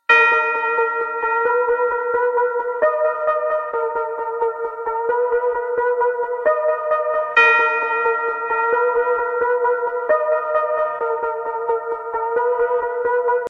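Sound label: galaxy s5 theme tune ringtone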